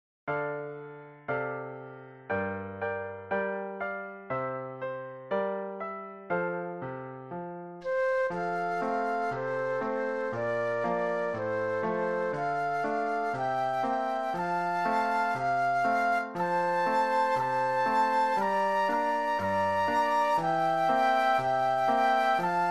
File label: Flute Duet and Piano